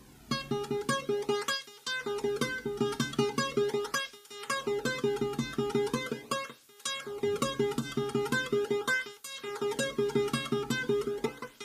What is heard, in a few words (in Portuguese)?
ensaio